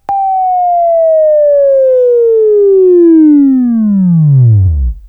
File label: WAV Right, Sweeping; Sweeping Training program